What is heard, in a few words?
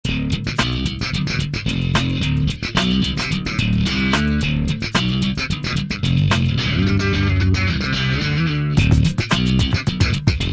distorted funk, bass